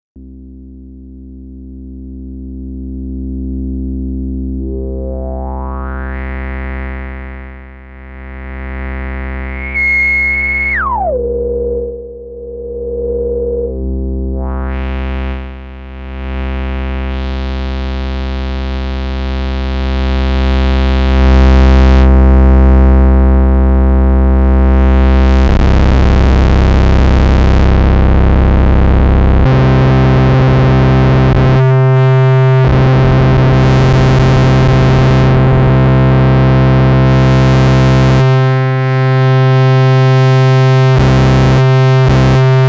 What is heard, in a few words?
Sub 37 Multidrive und Feedback